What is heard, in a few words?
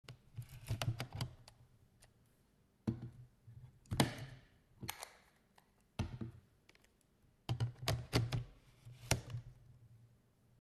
Changing lenses